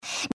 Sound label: breath; pony; Twilight Sparkle